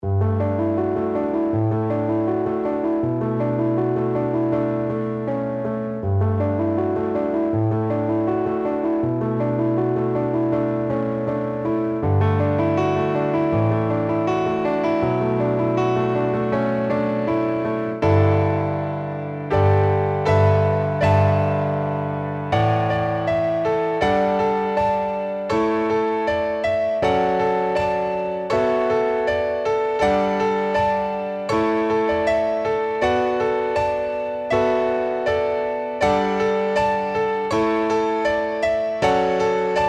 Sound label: air mini grand ballad